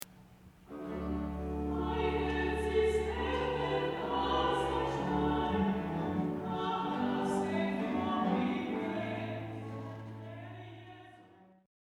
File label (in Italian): duetto